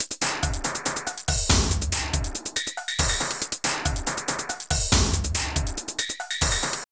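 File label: drum loops, Left; Big Fish Audio; Prosonus 500 Drum Loops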